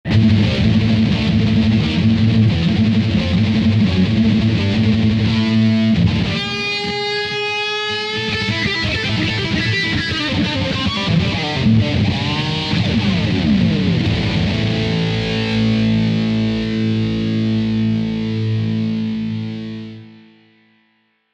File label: fat stereo lead